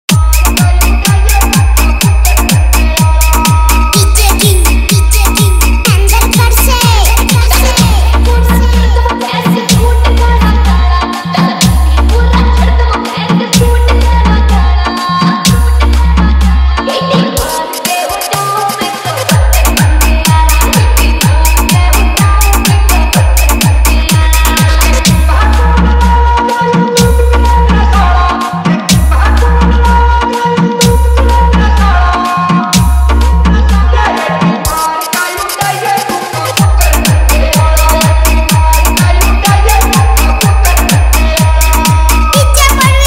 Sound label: Dj Remix Hard Bass High Vibration Mix